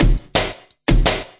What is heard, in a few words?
170 tek step punchy, drum loop; 161 to 180 bpm